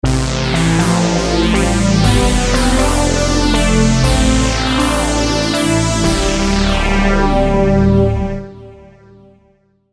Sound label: Full Fat Pad